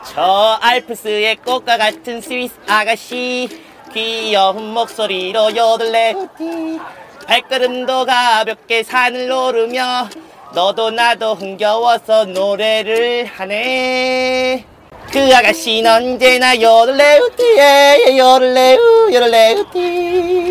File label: Yodel song; Pengsoo